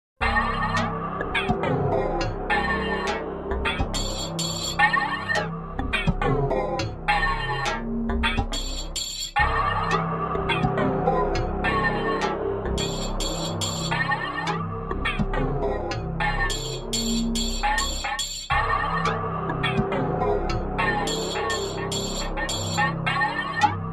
Machine Like Dark

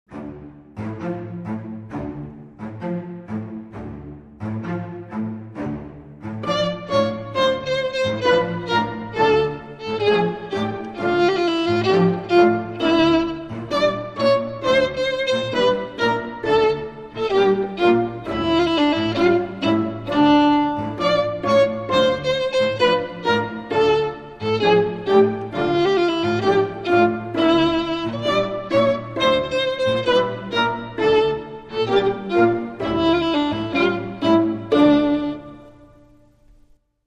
Violin Short

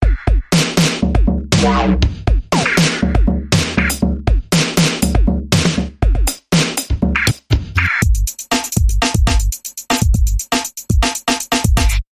Wow Percussion Bumper

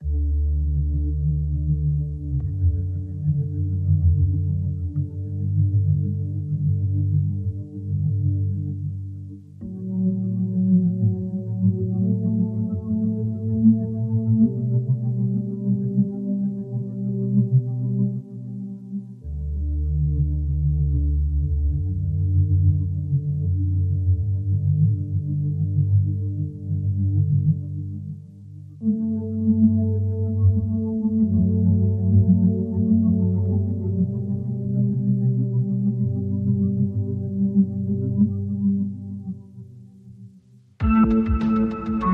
Robolove, Underscore

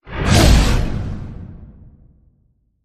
Werewolf Attack Flyby Hit

